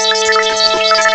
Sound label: SAMPLHLD, ANALOG; M8; CMI Factory